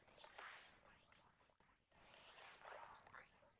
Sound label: Arabic; flames